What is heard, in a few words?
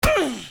uhhhh